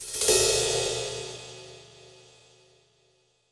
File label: Pop Ride Phrase, Cymbals; Roland BK-5